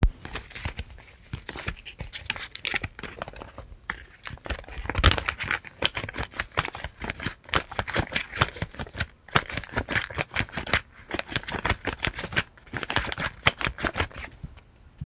Cootie Catcher

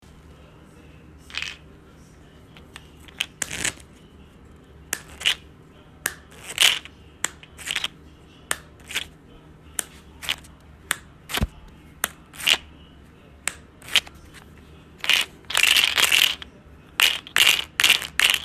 Bottle and book of filters

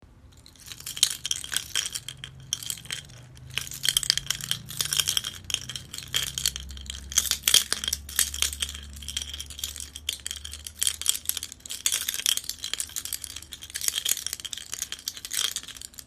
Keychains

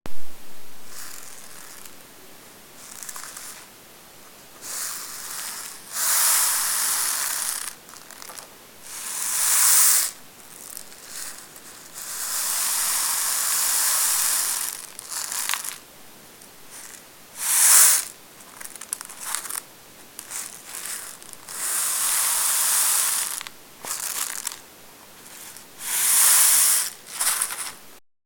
paper rainstick

